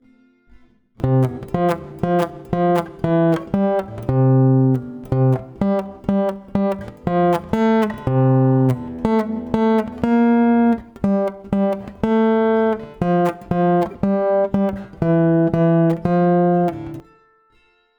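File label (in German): Melodie Brüderchen komm tanz mit mir; kinderliederquiz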